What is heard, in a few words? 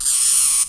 amb, Spinner